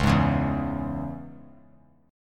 C sharp Minor, chord; C#m; strummed; JGuitar

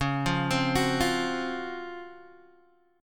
C sharp Major 11th Guitar DADGAD

